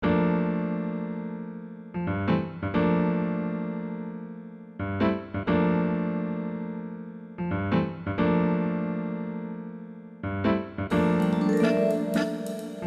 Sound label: jazz Song